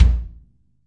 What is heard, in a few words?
yam kick, Real Kit; REAL LIVE KITS; Multisampled Real Kit BJ-HD9